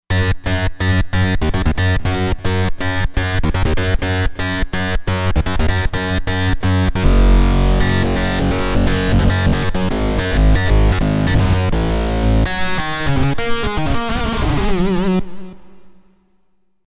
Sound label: Lead Mean Lead